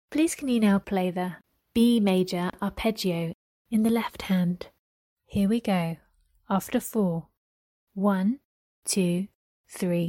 b maj arp lh

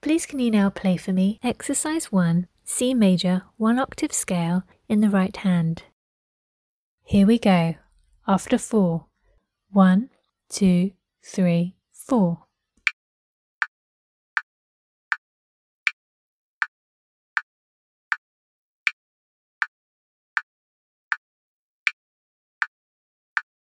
c major scale rh

